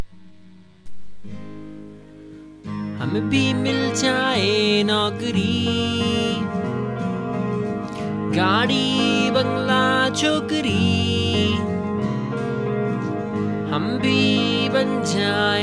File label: acoustic